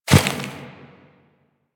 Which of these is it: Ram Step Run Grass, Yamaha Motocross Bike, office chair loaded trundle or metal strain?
Ram Step Run Grass